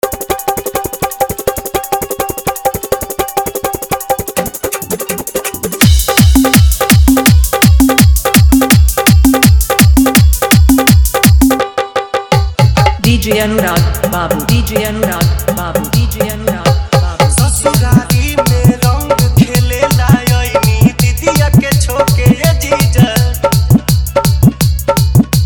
Holi Dhollki Bass Mix

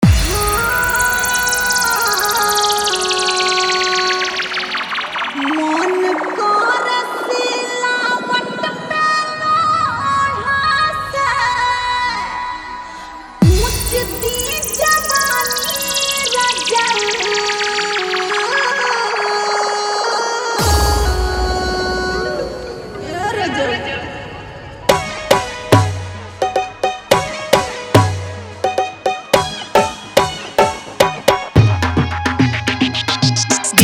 Dhollki Bass Mix

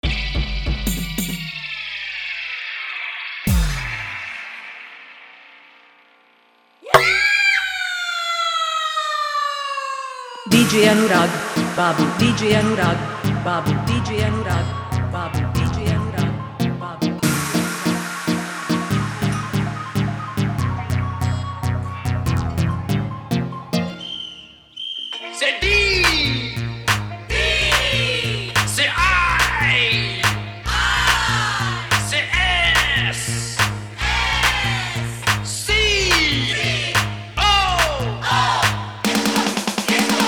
Jhan Jhan Bass Remix Song

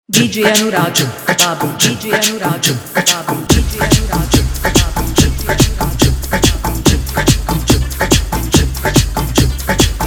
Dhollki Bass Mix; Full Dhollki Pawar Bass Mix

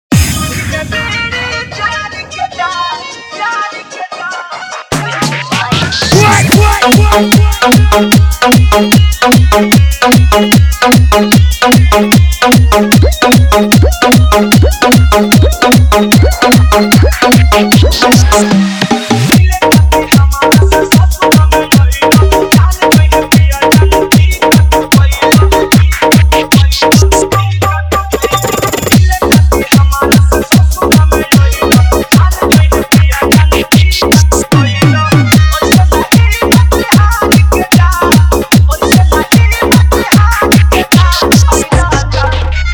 Bhojpuri Dance Mix; Super Toing Blast Rod

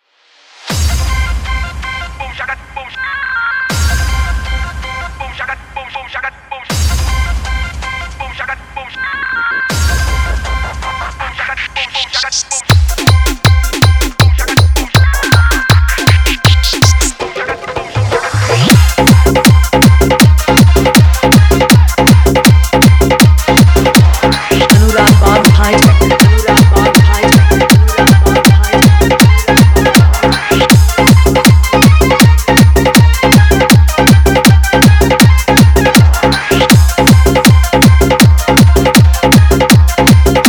Full Dhollki Bass Garda Dance Mix; JBL Blast Rod Show

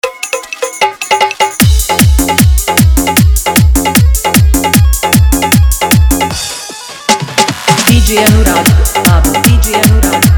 Chaita Dj Song Mix Full Dhollki Bass Mix

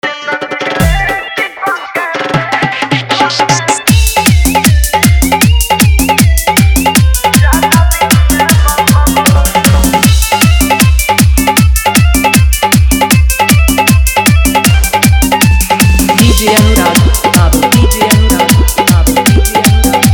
Dhollki Bass Dance Mix

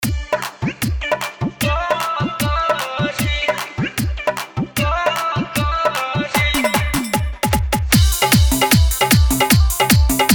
Hard Dhollki Bass Mix

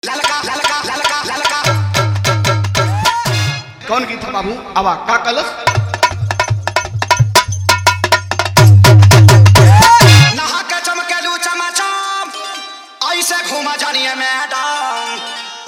Holi Bhojpuri Song

